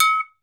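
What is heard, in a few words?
TOKE BELL, African Perc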